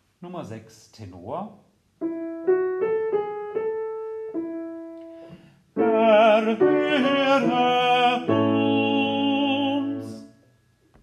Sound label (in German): Tenor; Dioezesan Caecilien Verband; DCV Chorfahrt; Übaufnahmen